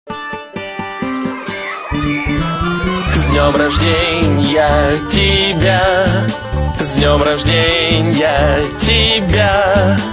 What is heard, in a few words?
Happy Birthday Song